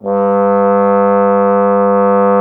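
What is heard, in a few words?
bass trombone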